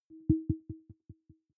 button echo tonal; signale

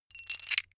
metal pieces clatter short

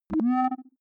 click swell filter saw, signale; kommunikation

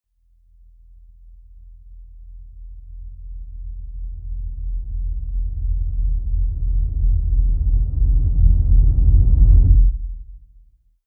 deep noise synth rumble sub, Risers; whooshes rises

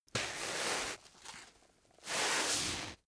door mat wipe shoes; haus garten; wohnen

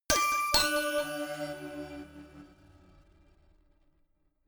notification glockenspiel crazy third up; instrumente

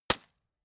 gun shot silencer close; schusswaffen

